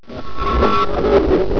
lever

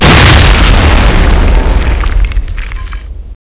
explosion

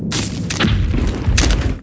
fisure

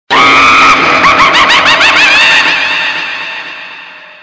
laugh; scary monster

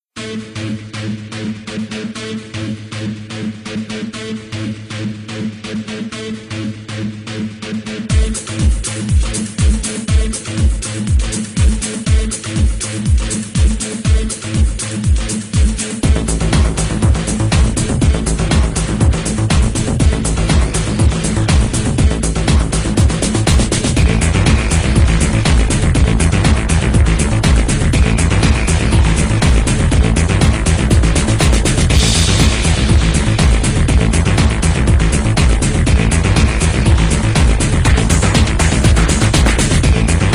trailer theme; sven; bshift

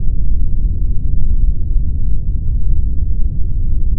rumble loop; meteor; w00tguy

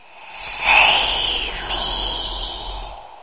ghost; sven; the hardrive

